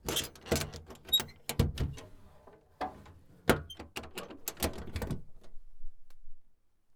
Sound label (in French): MALLE ARRIERE OUVERTURE FERMETURE